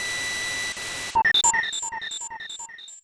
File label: Defense down; mother2